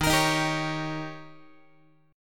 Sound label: D sharp 7th D sharp, Guitar 4A,4E,5A,5D,5G sharp,6C sharp; strummed; JGuitar